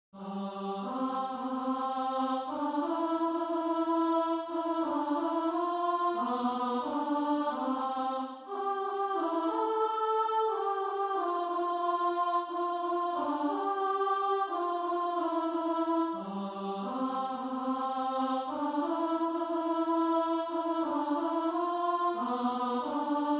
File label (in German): solo O Tannenbaum